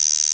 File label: Roland CR78, drums